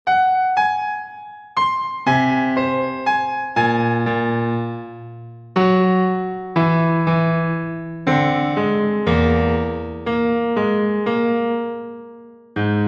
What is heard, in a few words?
whole Tone Piano Quarter Notes